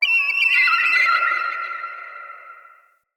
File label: Corrupted Beach Seagull Night; Ambience; Midrath Island